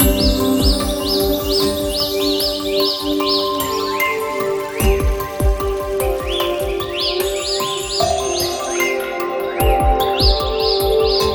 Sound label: Fantasy Forest; Age of Angels International; Android